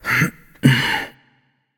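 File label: nonverbal grunt lift; voicepack; smite; merlin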